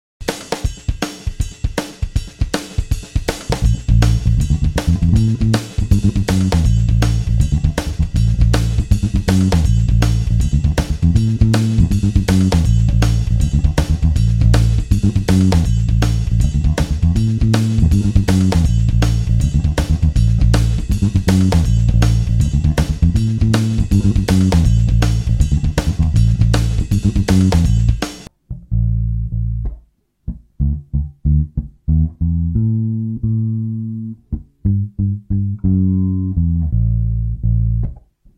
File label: fast shuffle bass in A